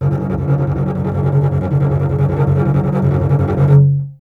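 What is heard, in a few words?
string contrabass; Miroslav Vitous; Solo Instruments VOL-2; Solo lnst. II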